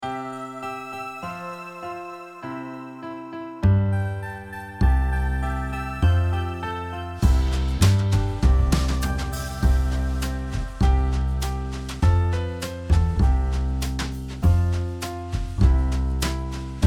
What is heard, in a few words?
The Eucharist Song Instrumental